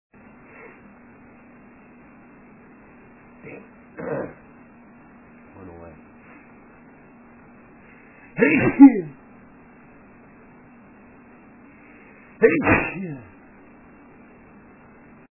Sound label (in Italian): Sternuto, male